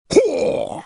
roar; udyr

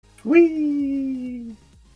weeee

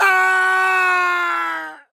fall scream; mk9; johnny cage

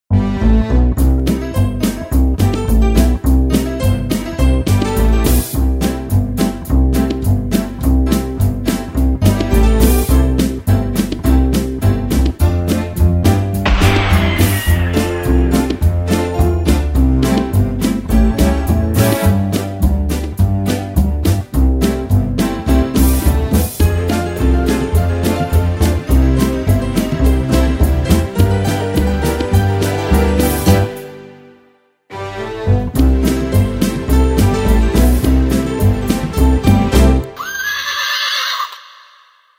Instrumental

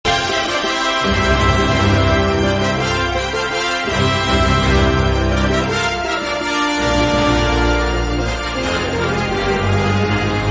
fanfare; Mando Fanfare

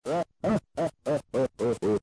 jajaja 8 bit

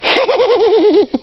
weasel laugh

